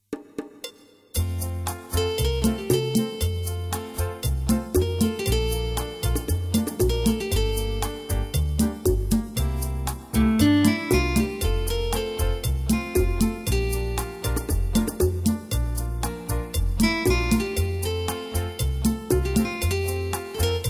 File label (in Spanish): mi guitarra